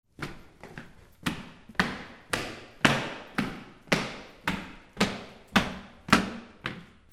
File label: FOOTFALL